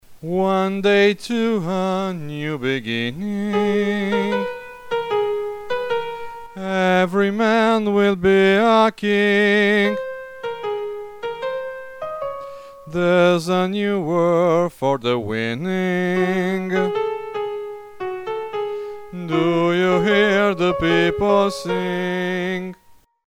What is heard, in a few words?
con bas